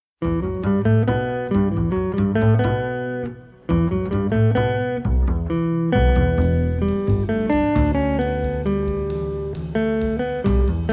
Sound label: Danza